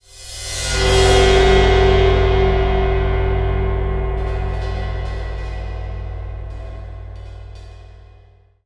Cymbal; Percussion Samples

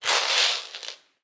Drag On Floor, Medium Wine Glass

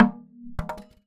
Polystyrene Rattle

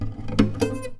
Classical Guitar Double Note Plink

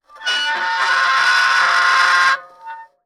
Soldering Iron Stand Drag

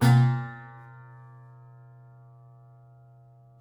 Prepared Piano

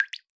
Drip; Drips

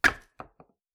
Palette, WoodenPalette

